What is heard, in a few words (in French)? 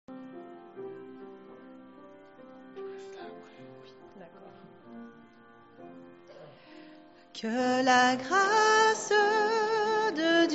Chant